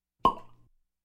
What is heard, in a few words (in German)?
Bei Tisch Flasche Entkorken